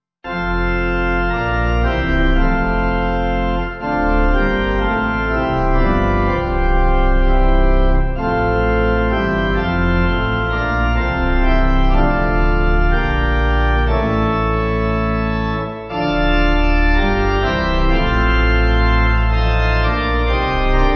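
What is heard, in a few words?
Pipe Organ, Music Backing Files for Churches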